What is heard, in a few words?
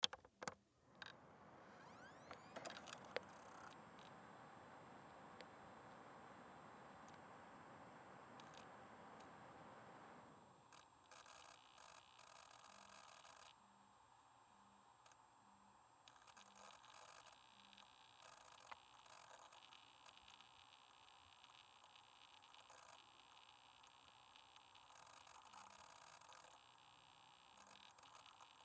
Laptop Turn On, Laptop Turn On Electric Hum; Sony Vaio Computer; Technology; Computers; Blastwave